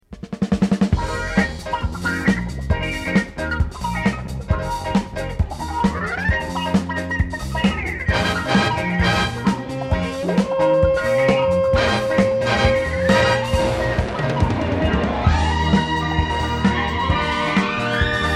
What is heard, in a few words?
Something Good Disco (with vocals) Stereo Band&Vocal Mix